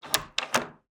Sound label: Door Handle; Sonic Bat; Videogame Foley Essentials Vol. I